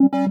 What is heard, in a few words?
cancel, ui; Stellaris